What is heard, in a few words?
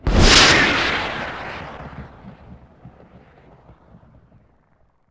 Sam Missle; Civ4 warlords